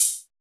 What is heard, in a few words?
SYN BASA M, TECHNO; Drums In Your FACE!